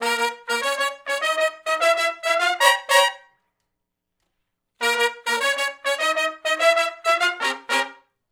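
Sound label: R&B Riff (Bbmin) unison to harmony, Horncraft; Sony Creative Software; Crimson Blue and Fabulous Horncraft 4 RnB; Horncraft for R&B; Sections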